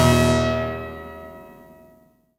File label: HOE DOWN, SYNTH; Keith Emerson Signature Series